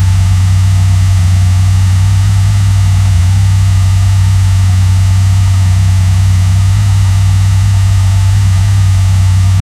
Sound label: loop suv engine v8 sound